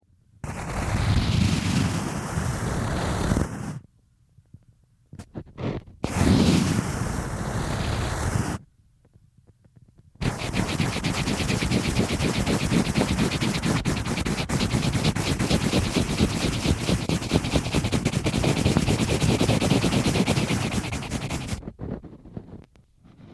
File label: sound drawing amplified pencil